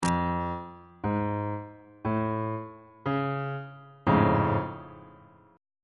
b double flat augmented major 7th 2nd inversion on bass clef